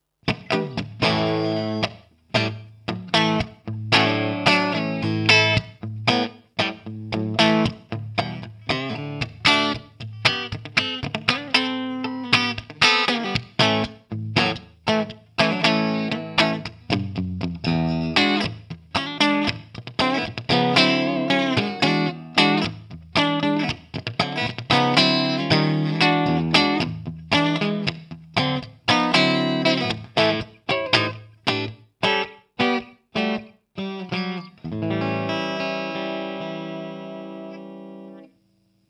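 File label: Gretsch Streamliner Clean, guitar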